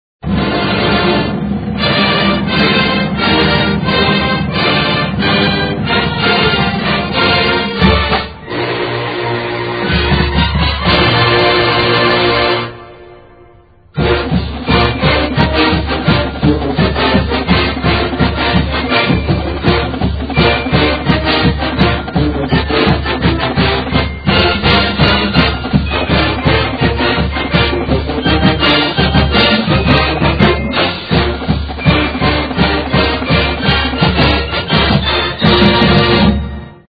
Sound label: Fight Song - Thumb Cheer and War Eagle; Auburn Tigers; NCAAF Football